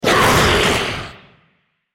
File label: Dragon spell cast effort; Shyvana